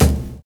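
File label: BOND bass drum; Univers Sons - Kitch 70s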